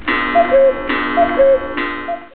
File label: cuckoo